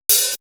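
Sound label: Hi hat, Drum One Shots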